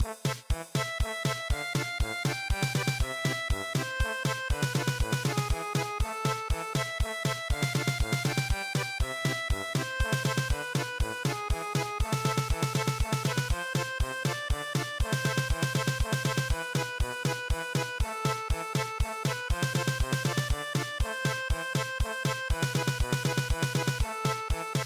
German Polka

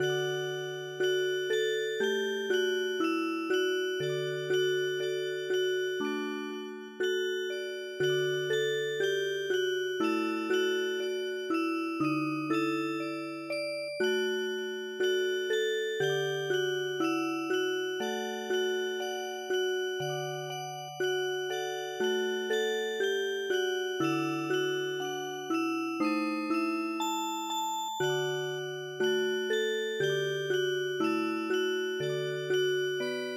turkish fishermans song Music Box; トルコ民謡の「漁夫の歌」に癒し系のアレンジを施したオルゴール曲; スローテンポな4拍子のその他アジアの伝統曲です; ＰＣ ＭＵＳＩＣＢＯＸ

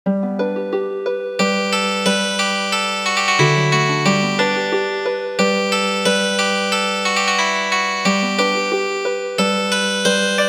Healing Harp

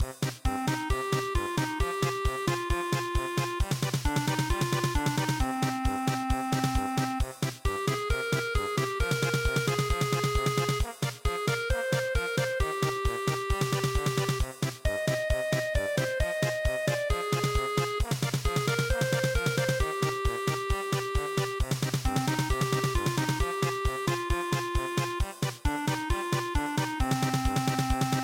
polka, midi; OGONEK

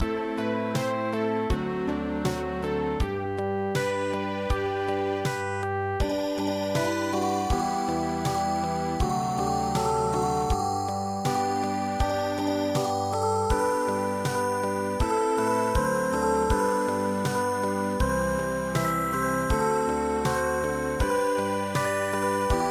Ballade; POTONG BEBEK ANGSA

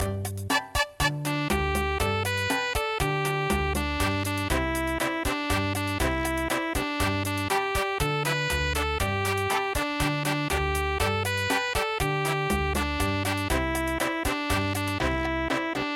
chachacha

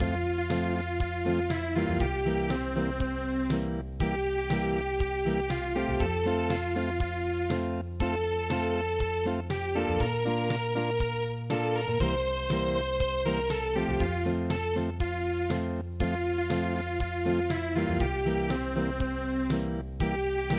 German Festival Acoustic

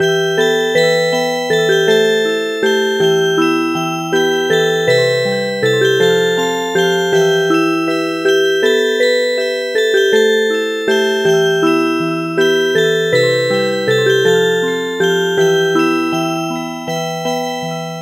Music Box; Abu abu abua

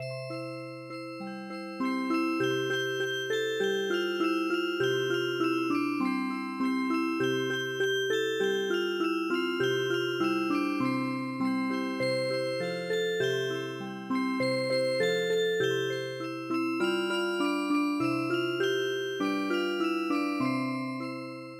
Music Box, traditional; Sarasponda

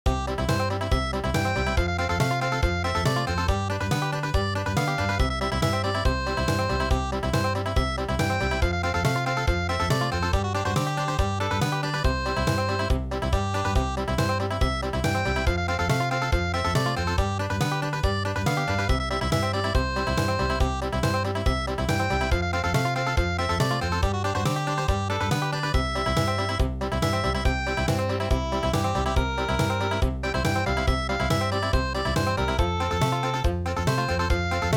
Country

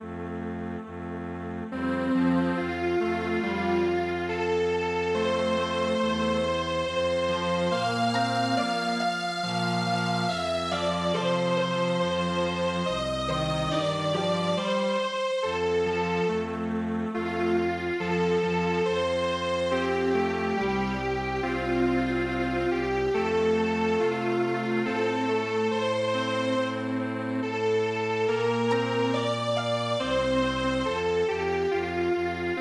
Spanish Strings Quartet; midi; traditional

